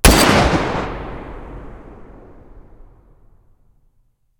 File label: svd muzzle mono, weapons